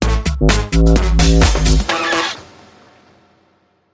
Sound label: Booty Bass, Sting